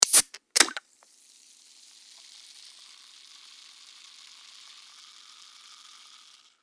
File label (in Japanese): 缶ジュース を 開ける